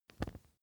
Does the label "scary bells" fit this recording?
No